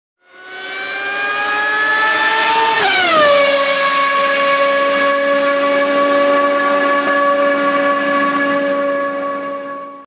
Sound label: Formula 1 Car